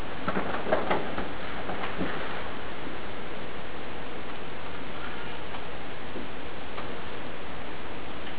in living room distant sound of a child

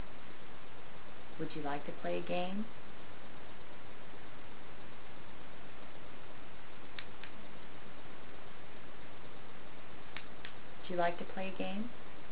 child claps 2 times

